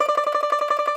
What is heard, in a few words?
Tenor Banjo, Plucked Strings